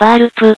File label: barp